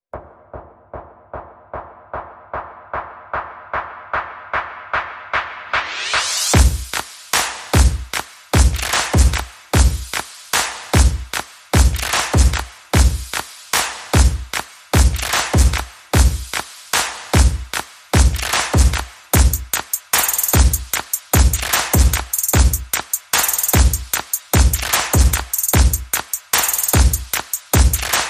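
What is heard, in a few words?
Percussion and FX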